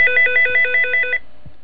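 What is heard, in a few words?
phone ring; WINFAX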